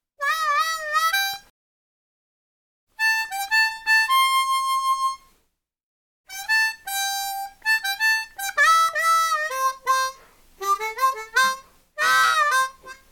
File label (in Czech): foukaci harmonika